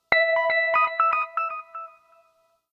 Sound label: Echo, notifications; LG; Viper LS840